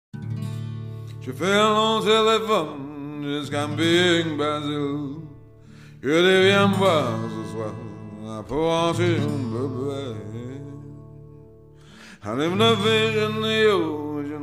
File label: telephone; port arthur